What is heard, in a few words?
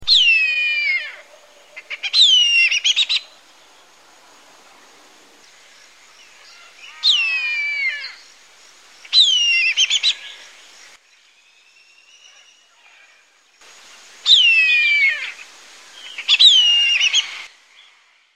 kite whistling, bird calls